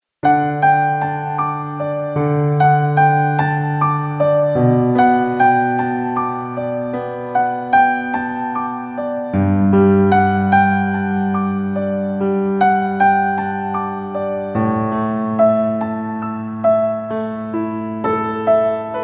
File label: piano moods